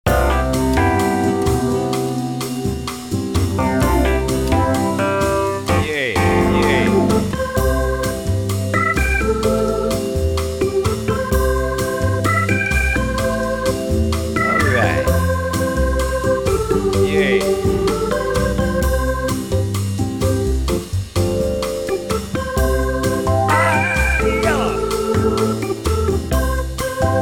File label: Bongos Karaoke play along